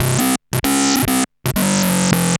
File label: Arp E Line, ARP Odyssey; musicradar-synth-samples